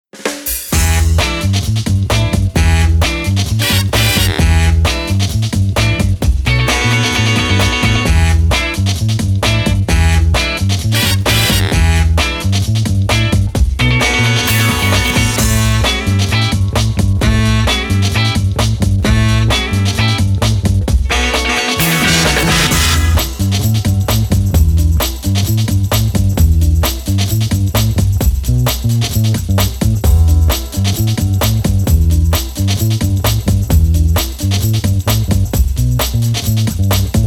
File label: Funky Open